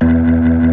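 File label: E1 fast power, power B3; Northstar - New Gold VOL-1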